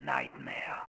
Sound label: Whisp